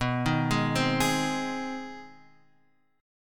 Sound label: Ab Major B; arpeggiated; Ab/B; JGuitar